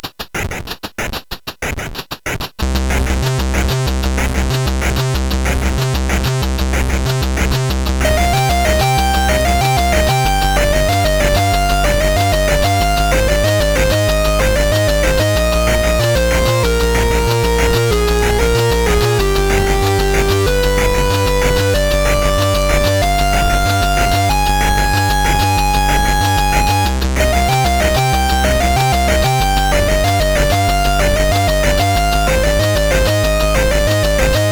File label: CSG8580R5; sid